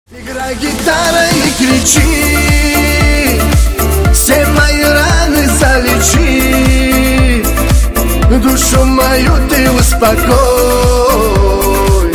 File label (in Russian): igraj gitara